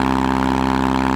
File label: Loop, Engine, buggy